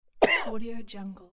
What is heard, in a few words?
short cough